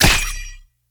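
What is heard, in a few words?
ninja sword, impact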